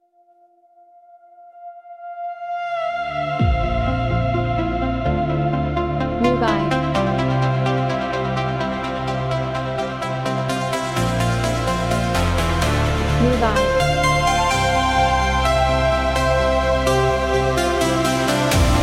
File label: Electro space